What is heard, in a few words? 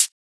HiHat, Psytrance; Drum Shots